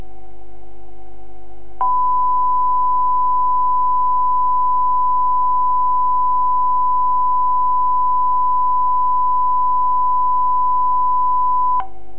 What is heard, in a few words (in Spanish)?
cristal